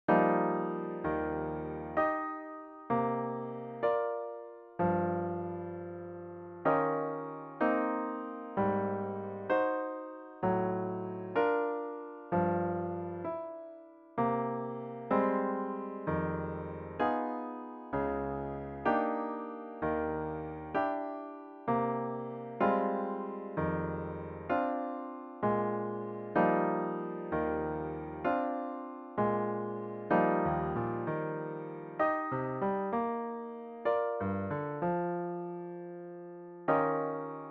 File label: solo piano